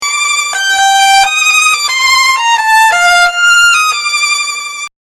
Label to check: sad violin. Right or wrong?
Right